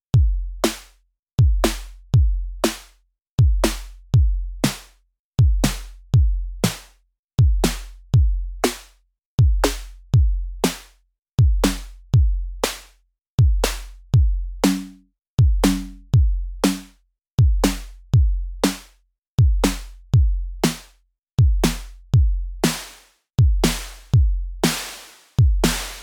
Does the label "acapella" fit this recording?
No